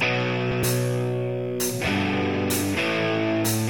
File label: guitar mother, Loops